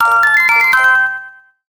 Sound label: Item Get